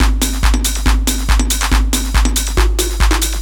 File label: hard beats 140, hard trance beats